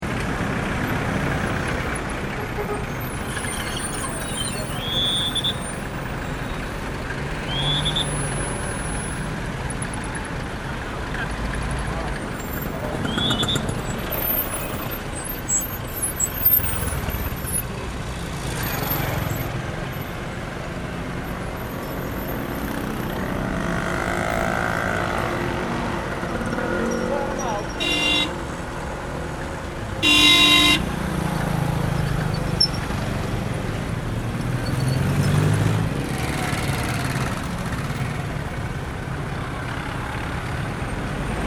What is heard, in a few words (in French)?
Ambiance Carrefour